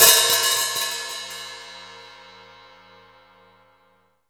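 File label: open hi hat, 14 inch old hi hat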